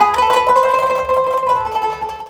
World Lick

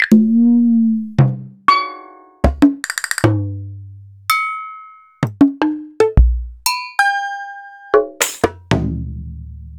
EMU Planet Earth

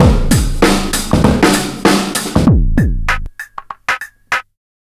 97.2 bpm; BONITA; 90 s Sample CDs; Planet of the Breaks

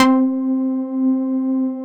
DANC, synthesizer bass; Synthesizers; Soli-Music; World of Synthesizers VOL-1

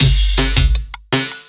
LOOP, Drum & Bass, 170 BPM

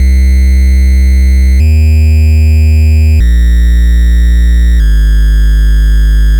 NES Bass, chiptune; Sonic Foundry (Sony Creative Software) - 8-Bit Weapon A Chiptune Odyssey; Nintendo Entertainment System

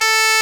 ScotBagpipes A2; NorthStar - Global Instruments VOL-1

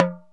TALKING HI, Drum Machines, Akai MPC-3000

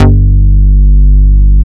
Synth Bass, Roland

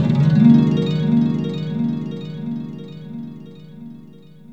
HARPY, Synth FX Misc

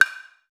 Ohkawa, Percussion; Roland BK-5

